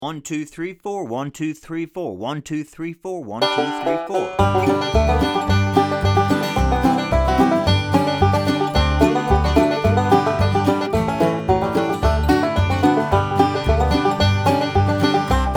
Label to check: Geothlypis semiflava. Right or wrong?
Wrong